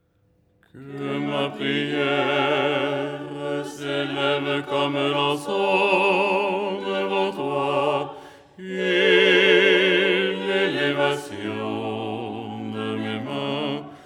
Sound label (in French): basse; " Que ma prière s'élève…"; Les 8 tons en français